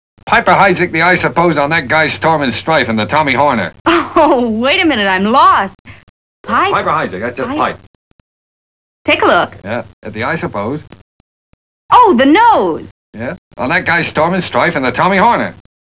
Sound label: jingle